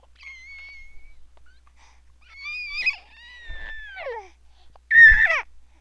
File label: squeal